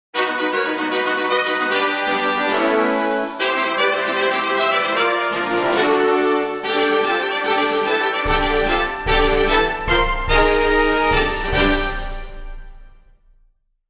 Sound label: fanfare, jingle